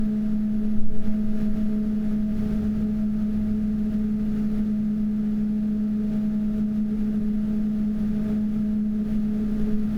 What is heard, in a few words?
Max RPM